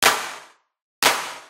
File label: clap